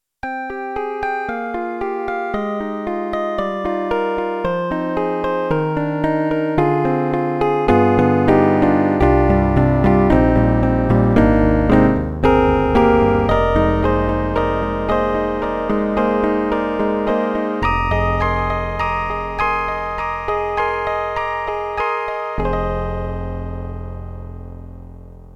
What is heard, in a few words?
Roland MT-32 Acou Piano